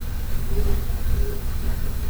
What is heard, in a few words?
cute puppy cleaned up